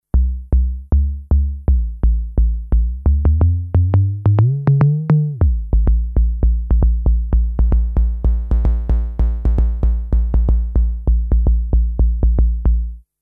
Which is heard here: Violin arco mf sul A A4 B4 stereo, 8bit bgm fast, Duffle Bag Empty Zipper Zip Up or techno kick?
techno kick